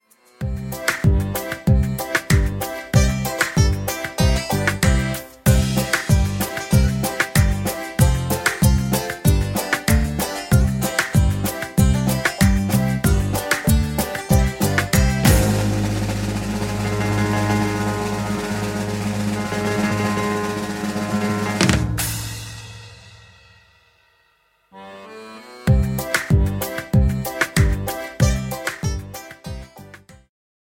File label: Karaoke